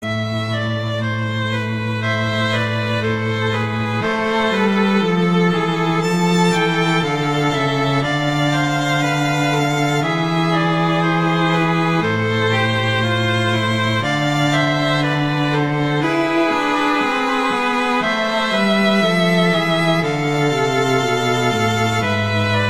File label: Adagio; Corelli